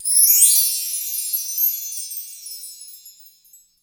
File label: PERC